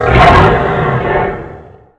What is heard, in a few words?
Short Roar